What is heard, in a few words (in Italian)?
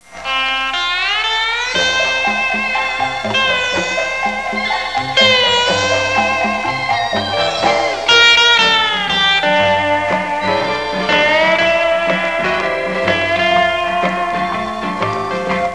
musica strumentale